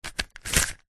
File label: leisure puzzle cube single rotate; rubiks